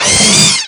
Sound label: alien pain; garrysmod